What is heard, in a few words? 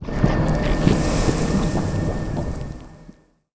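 toxic waste; buildings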